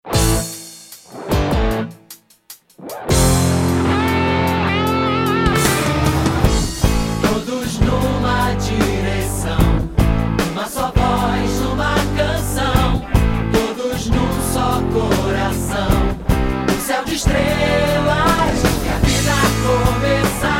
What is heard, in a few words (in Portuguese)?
Jingle Rock; ITAU; Rock N Rio